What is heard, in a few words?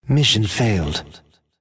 Die, cyborg; Rampant Cyborg; Mob Voice